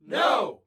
Human Crowd "No"

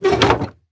Chair Wood Chair Scrape On Wood Floor; household

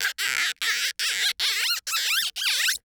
Cartoon Balloon Squeaks; AdobeCC

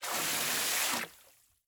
Liquid Water Water Spray Burst Of Water

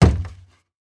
Impact Hand Hit And Slide Wood Door Single; Impacts

